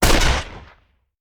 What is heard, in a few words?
Rifle; Sound Ideas; Guns